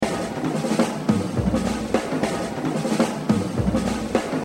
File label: Jazz Drumming; Exercise 22 Buddy Rich - 84%; The Evolution of Jazz Drumming (Gottlieb)